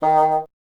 bassoon D#; Miroslav Vitous - Solo Instruments VOL-1

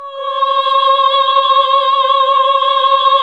HAL AAH, Nuns Aahhh

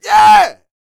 YELL, MALE HUMAN; Spectrasonics Vocal Planet

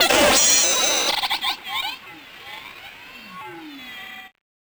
Malfo Syn, malfunctioning synth

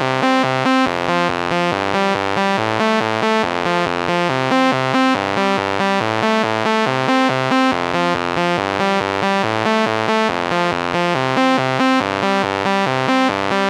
140BPM C; Vengeance - Essential Clubsounds Vol.4

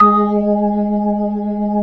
B-3, Jazz

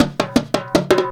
junku groove; Best Service; World Colours

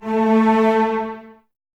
Cellos A 3; Miroslav Vitous; String Ensembles; LINKS ORCH

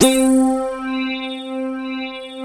PPG STACK C4 L, Analog; USB Soundscan vol.49; Analog Fantasies; AKAI

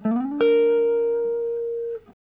GUITAR LICK; Ueberschall; Jam Box

